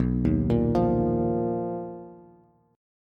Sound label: B Major Sharp 11th, Bass, Bass Standard; BM#11; arpeggiated; JGuitar